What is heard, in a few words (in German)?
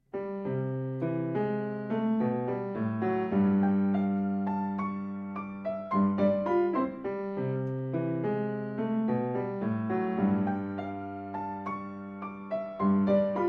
Bagatelle C dur, piano